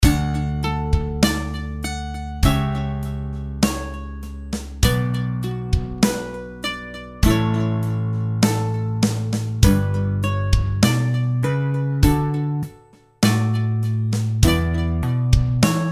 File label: delay